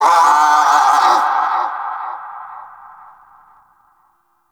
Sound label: Lure Call Success; Warframe; Hunting Critters; Vulpaphyla